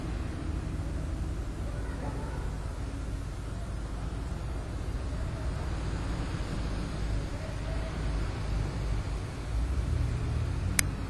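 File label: Church Pulpit click; Community Methodist Church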